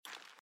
armour Light Run; out of reach treasure royale 2020 gamerip pc

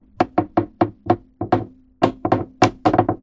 Atmo Random Creak

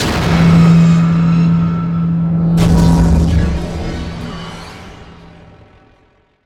Victory Banner Appear

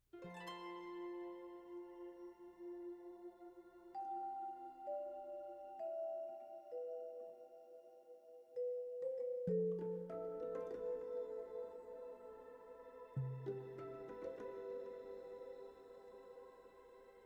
Forest; Forest 1

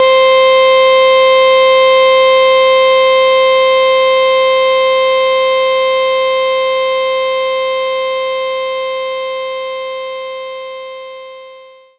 violin viola C5

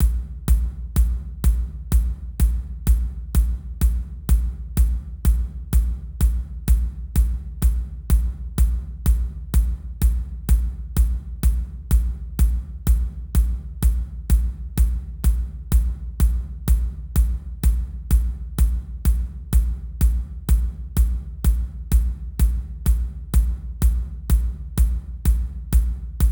Music Kick; Plants vs. Zombies Battle for Neighborville; TurfTakeover MiniGame

